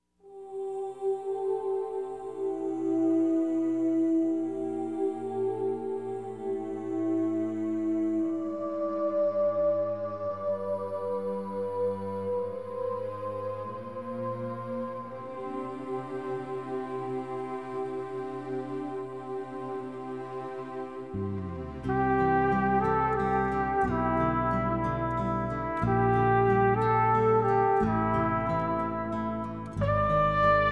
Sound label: Concert In C Major For Two Trumpets